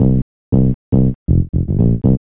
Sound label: B-LOOP